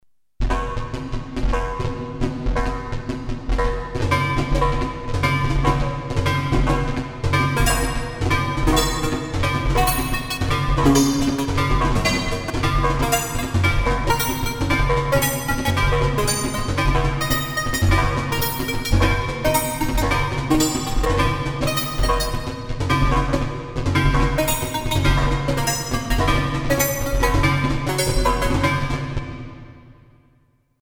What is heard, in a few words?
fx perc, yamaha sy99; synth